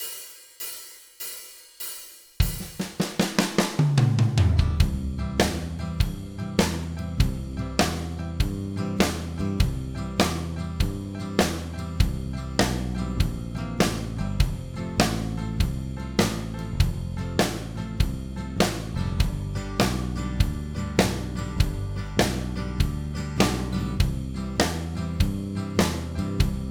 beginner country bend solo, backing tracks

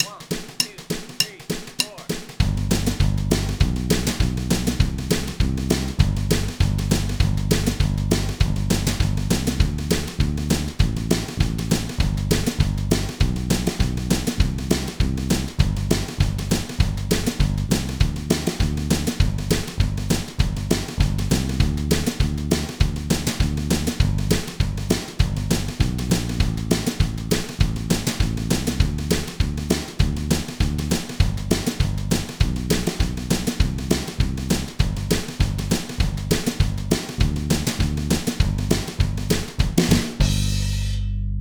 telecaster bluegrass chicken pickin

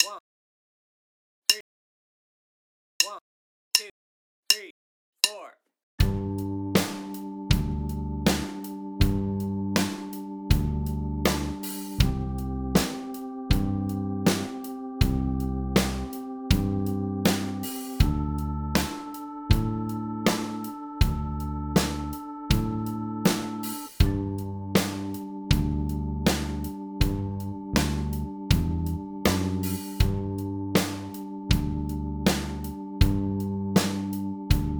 all major triad shapes, backing tracks

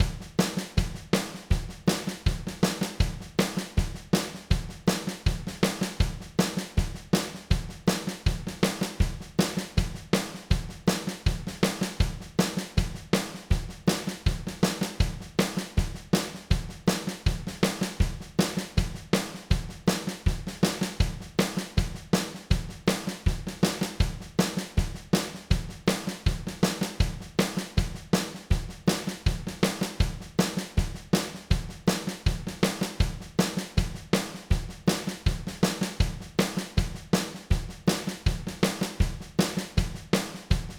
essential rhythm pattern, country guitar

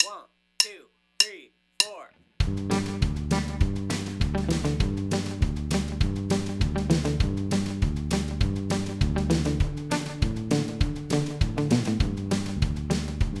chicken pickin bluegrass

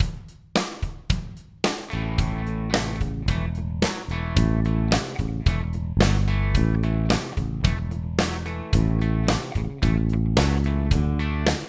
intermediate tonk, backing tracks; honky tonk guitar solo in a

